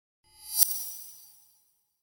Whoosh Metallic Reversed Thin Attention Grabber - Very High; Hollywood Edge; sound designer's toolkit v2